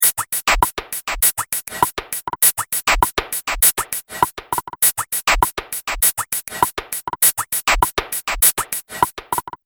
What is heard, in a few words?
100 Bpm Hip Hop Beats Loop; Royalty Free Hiphop Music; Percussive And Rhythmic; Seamless loop